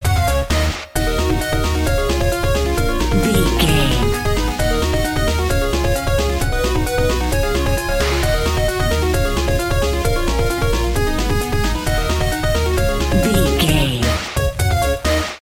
big boss battle video game

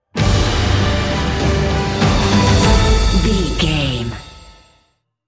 cinematic corporate sting bumper

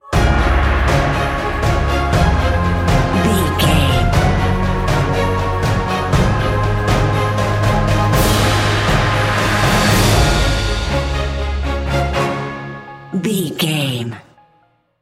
sunshine morning cinematic corporate

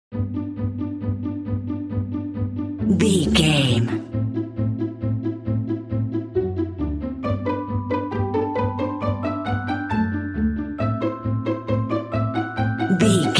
bright fun cute classical

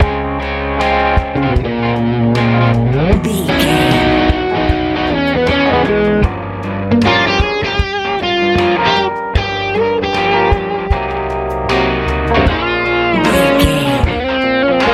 easy pop

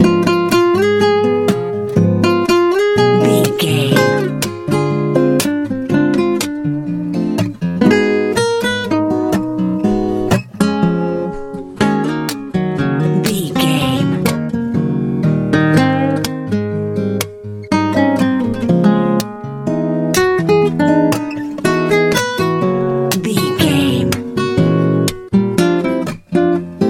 blooming warm easy campfire

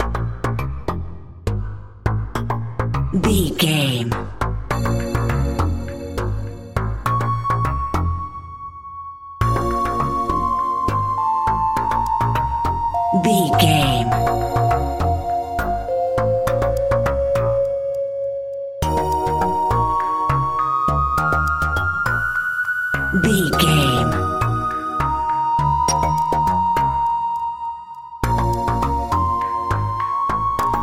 mysterious task video game

